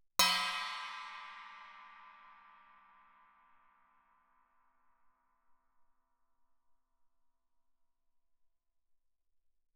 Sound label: chinese stick bell ff, suspended cymbals; Percussion